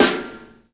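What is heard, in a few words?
snare drum funk; E-Lab; X Static Goldmine 2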